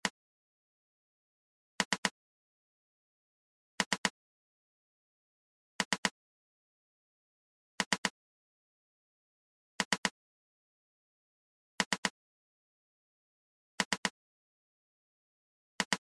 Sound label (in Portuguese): Toque - Repique